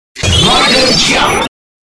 jump

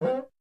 cuica sound note

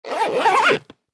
ziper

